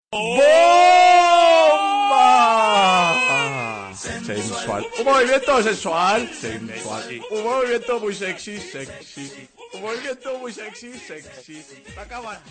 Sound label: bomba